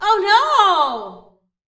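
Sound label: Female Frustrated Shout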